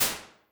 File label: Masterverb Room, Ambience and Small Rooms; EDSP7500 Masterverb; Ableton; Convolution Reverb